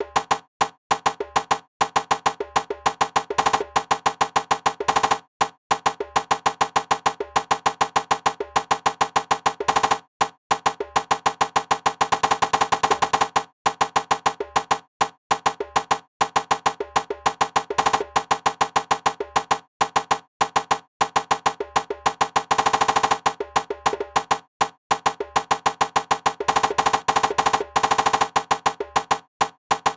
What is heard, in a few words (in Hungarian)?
ritmusos